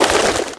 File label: flying; Pokemon Solar Light & Lunar Dark 1.0.1